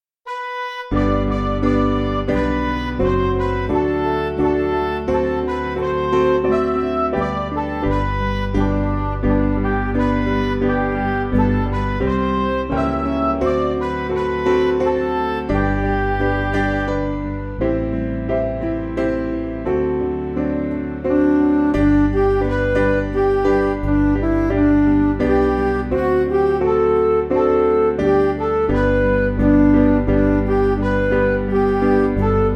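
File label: Music Backing Files for Churches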